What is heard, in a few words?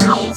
Synth Shots; Vengeance Samples